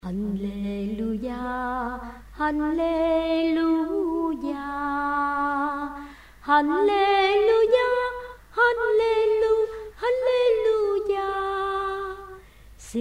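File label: soprano tenor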